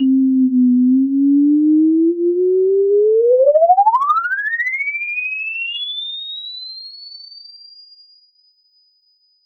very short game ui sound

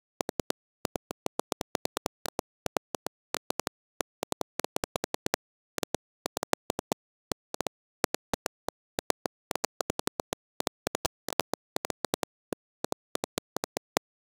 realistic subt, human body

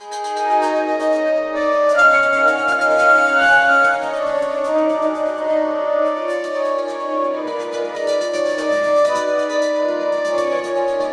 middle eastern inspired a, oud

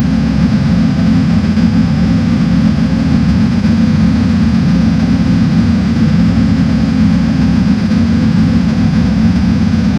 a clean loop of a, falcon

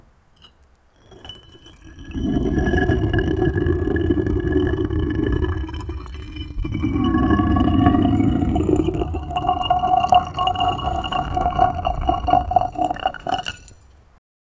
running stone massive sto, giant

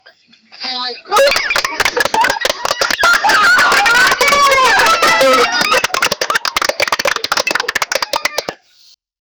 loud exaggerated cartoon, kids laughing

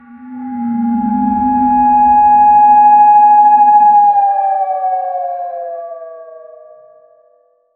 create a post apocalyptic, siren

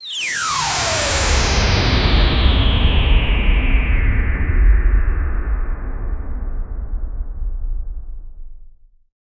anime dash speed whoosh; motion

